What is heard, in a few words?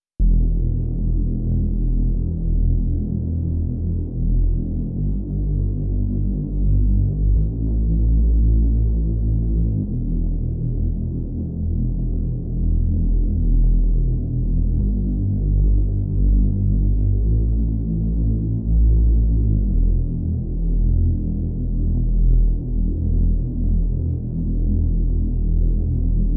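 Headrush Synth Drone Bass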